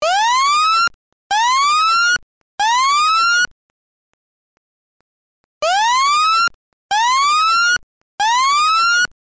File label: nfpa temporal coded slow whoop; DP3000